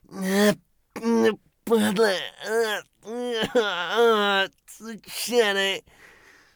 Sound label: agony; Escape from Tarkov